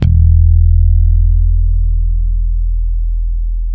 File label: E 2, ultra pick, bass; Spectrasonics; Bass Legends CD 1